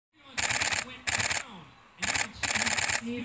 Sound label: Canon Camera Shutter